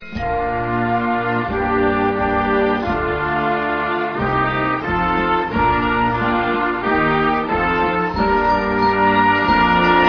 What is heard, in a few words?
American Folk Triology - Excerpt